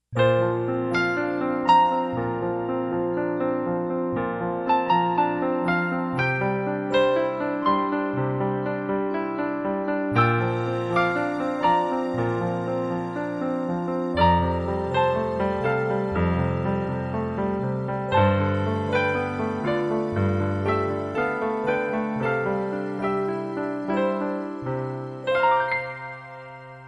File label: Piano; business music